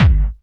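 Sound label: hard bass drums; Bazzdrumz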